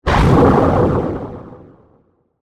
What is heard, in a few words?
Hit Flange Warble; Hit Sound Effects; Sound Design; BlastWave